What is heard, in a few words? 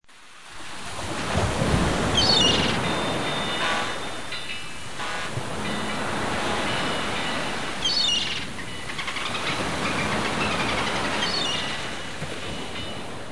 sea mix